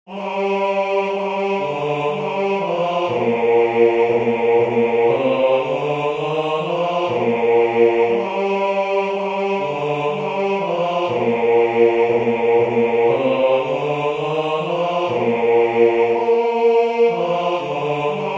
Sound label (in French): basse; belle qui tiens ma vie